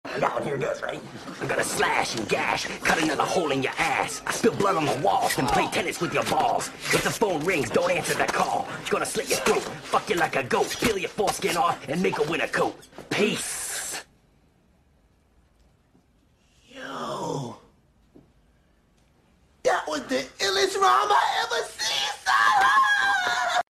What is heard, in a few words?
scream rap